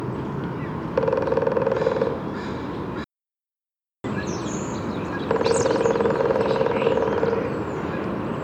Northern Flicker Colaptes auratus

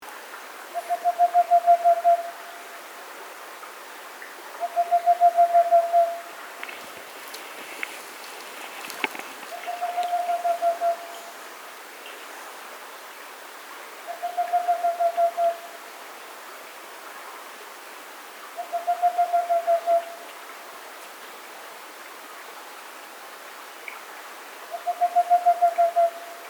Whiskered Screech Owl Megascops trichopsis

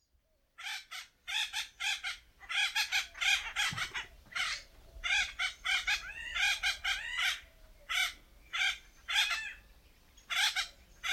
Acorn Woodpecker Melanerpes formicivorus; Russ Wigh

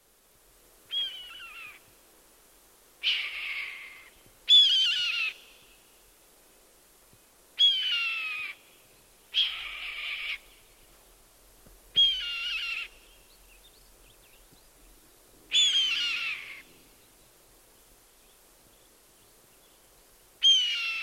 Red-tailed Hawk Buteo jamaicensis

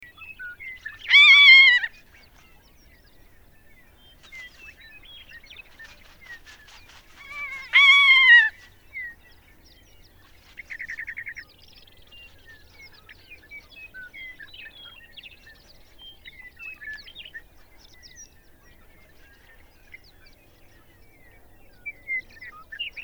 Ferruginous Hawk Buteo regalis

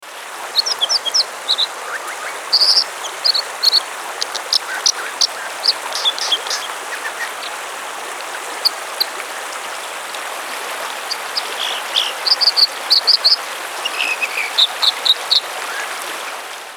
American Dipper Cinclus mexicanus

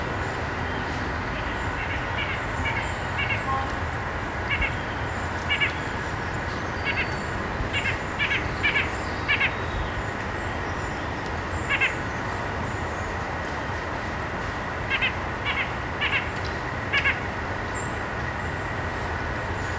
White-breasted Nuthatch Sitta carolinensis

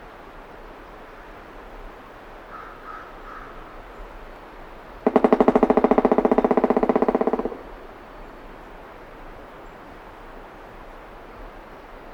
Pileated Woodpecker Dryocopus pileatus

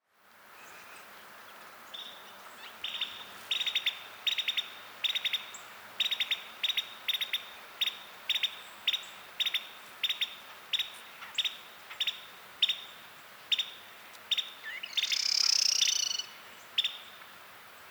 Carolina Wren Thryothorus ludovicianus

